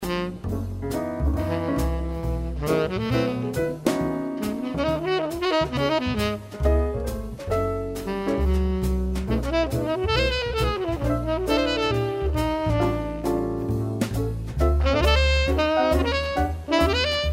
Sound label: jazz